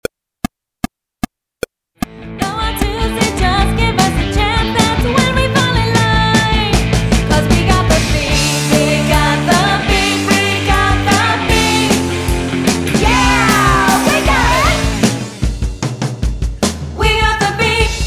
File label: 80s Band